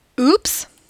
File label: oops